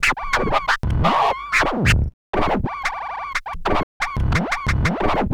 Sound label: Scratch; Sonic Foundry (Sony Creative Software); Infinite Soul VOL-2; Turntables